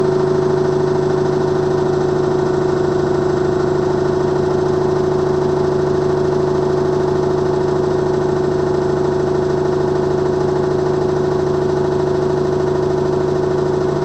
Fast Steady State, Washing Machine Wash Spin Cycle